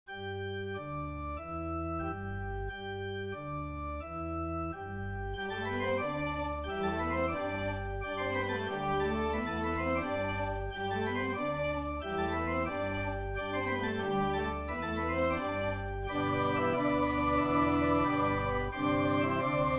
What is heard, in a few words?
Organ Festive Fanfare